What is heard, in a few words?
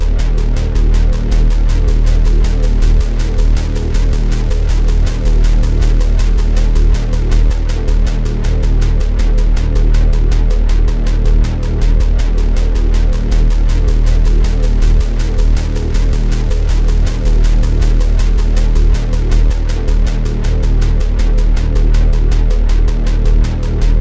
chase; Mirrors Edge